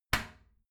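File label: toilet seat lid close regular, bathroom; haus-garten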